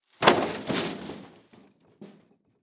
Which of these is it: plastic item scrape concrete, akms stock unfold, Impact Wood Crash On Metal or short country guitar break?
Impact Wood Crash On Metal